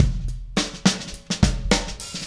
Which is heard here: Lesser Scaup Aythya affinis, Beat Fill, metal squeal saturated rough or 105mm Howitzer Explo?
Beat Fill